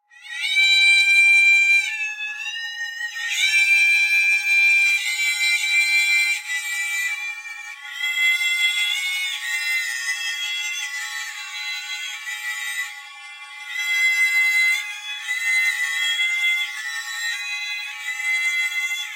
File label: rope spectral stretch; Women's Song with Spectral Stretch; Michael Norris